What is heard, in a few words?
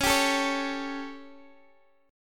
G Suspended 2nd Flat 5th Db, chord